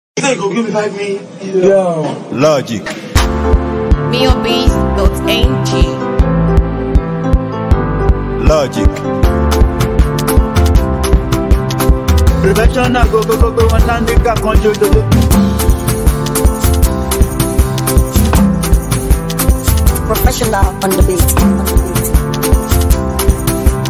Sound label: Beat